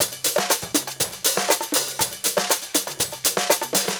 120 beat perc, Nu Jazz House; 120 Beats; PowerFX

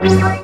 Intro Jingle; Kyle Petty's No Fear Racing (Circuit USA)

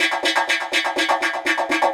Echo Chuff, Synthetic Pulses

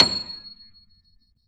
C 7, Keyboards Acoustic, GRANDP ST; USB Soundscan vol.17

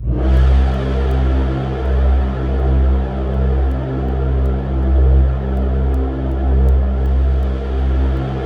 C2, Synth Leads; 90 s Sample CDs; NiceBeats (NiceBytes); Studioline 09

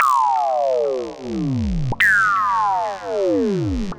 Zap Chord, Synth SFX; Sonic Foundry (Sony Creative Software); The Electronic Music Manuscript Collection

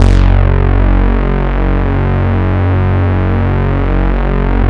SYN OSC ARP, Microwave; Sound & Vision - Gigapack I CD 2

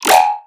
synth hit yoink crispy, E; Virtual Riot

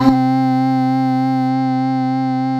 VOXY C2; Best Service Alpha Dance vol.2

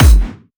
Distortion Kicks, Bassdrums

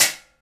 lid, Trash Tuned; Roland L-CD701; Trash+Kitch

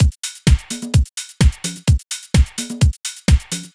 Mixed Beat, EDM; Sonic Foundry (Sony Creative Software); Afterhours EDM; Retro Fit

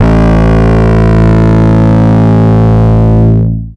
Basses, A; Total Drum&Bass

